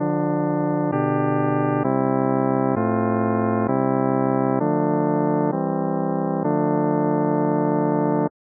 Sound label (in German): dorische kadenz